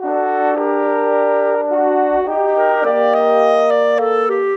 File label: Brass Winds; Sonic Foundry (Sony Creative Software); Orchestral Series VOL-3 - Cinematic